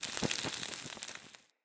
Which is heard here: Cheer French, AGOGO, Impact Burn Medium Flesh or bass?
Impact Burn Medium Flesh